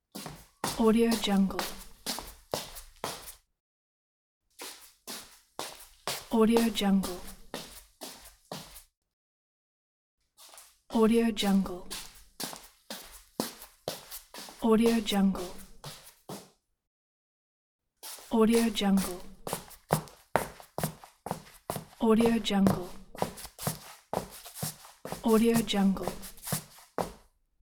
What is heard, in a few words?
shuffling footsteps; boxsound